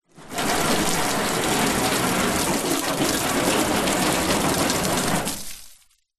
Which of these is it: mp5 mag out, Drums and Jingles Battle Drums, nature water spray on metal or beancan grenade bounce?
nature water spray on metal